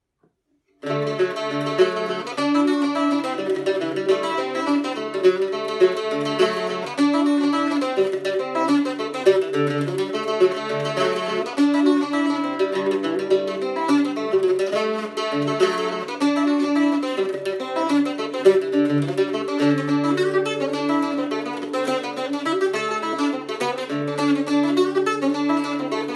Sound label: the holly bush octave mandola; Listen to Caitríona playing The Holly Bush on octave mandola